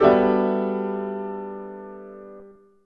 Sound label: Piano Chord